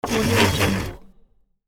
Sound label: typewriter electric